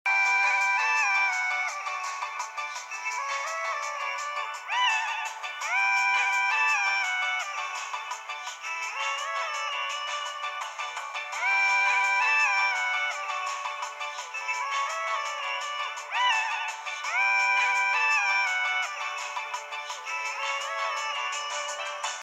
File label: Coral Chorus